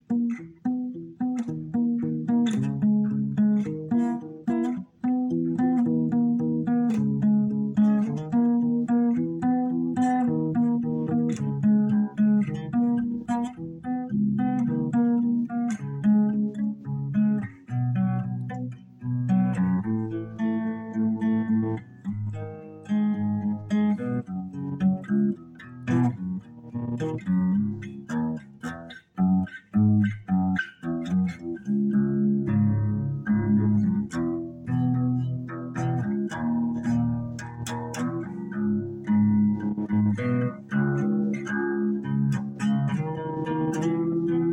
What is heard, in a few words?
guitar